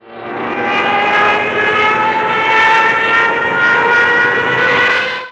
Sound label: power dive